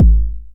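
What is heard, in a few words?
909 BD tape, kick drum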